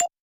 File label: notification